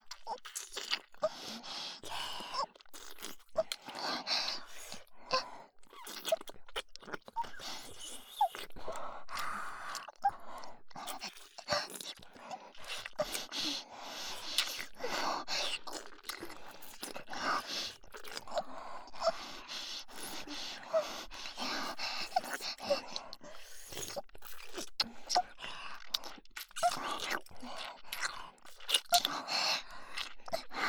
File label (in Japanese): キス; ルナとヒカリ